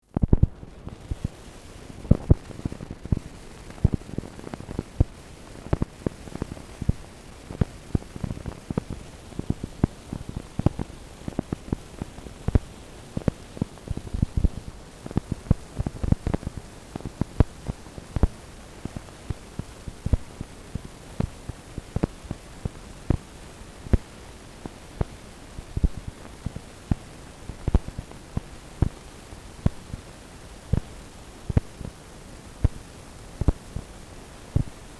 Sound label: tape